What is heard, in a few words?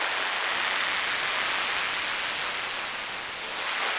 sparse soundscape samples; ReLooped